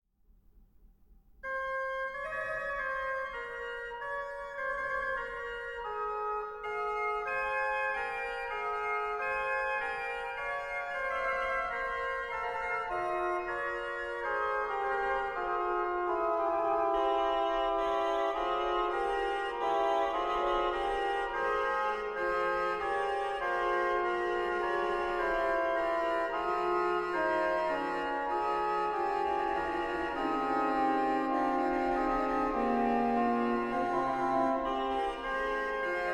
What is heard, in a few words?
Magnificat Trio, organ; Dandrieu; ebersmunster